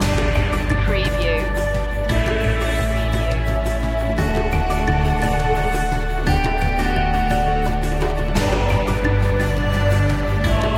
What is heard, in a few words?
with flute solo